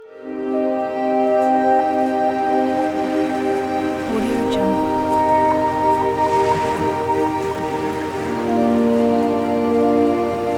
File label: meditation relaxing piano waves